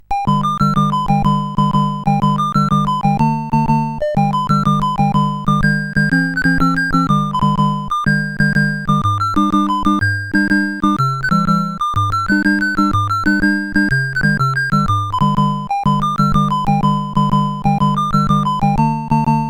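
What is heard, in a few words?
Maxi Golf, MOS 8580 SID chip music, games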